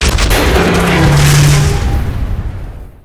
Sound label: Iron Juggernaut, Death